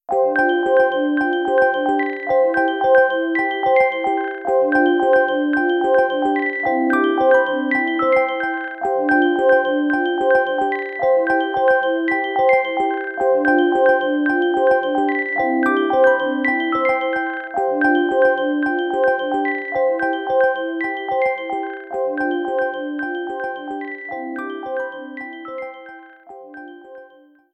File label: Nintendo 3DS Nintendo eShop Loading